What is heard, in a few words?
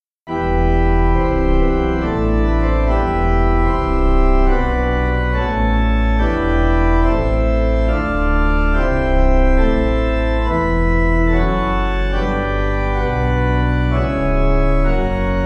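Agnus Dei, Pipe; Willan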